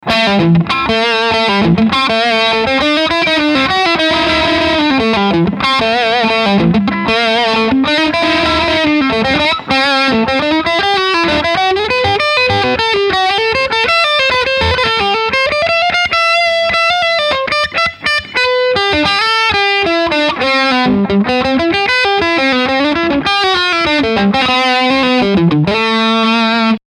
hagstrom super viking overdrive steg pickup

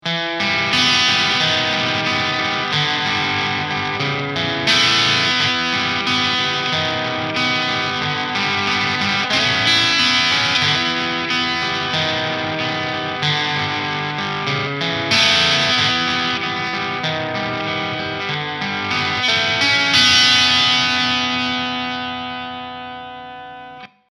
steg crunch; harley benton te-70 test

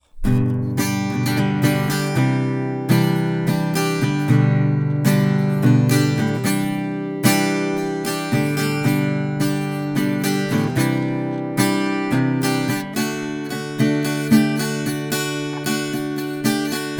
12bund richtung schalloch; audio-technica at2031

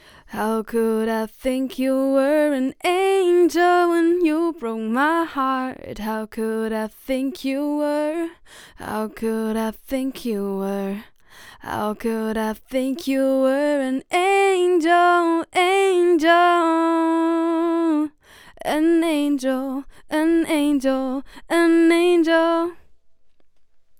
rode nt1a gesang

